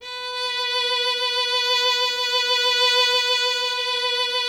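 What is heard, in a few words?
string violin, Solo Instruments; Miroslav Vitous - Solo Instruments VOL-2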